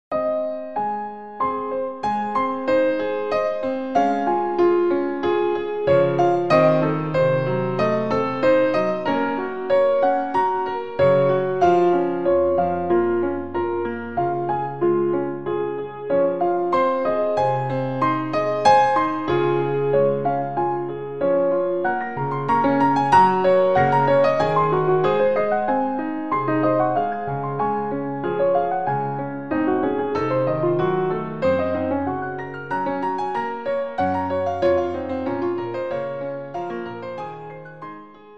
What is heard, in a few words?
piano